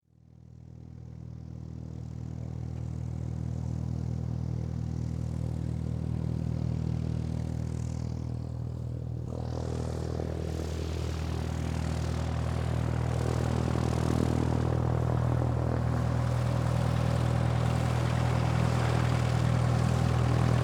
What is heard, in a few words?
Airplane Cessna Taxi By, A Cessna propeller aeroplane drive by; taxi by on runway